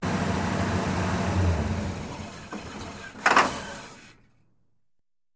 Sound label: Car Cough Off